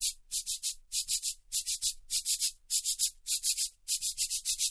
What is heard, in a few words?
cabasa, Drum loops